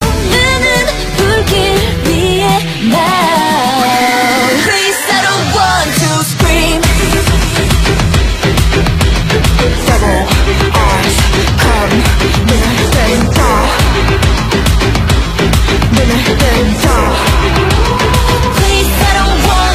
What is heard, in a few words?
Scream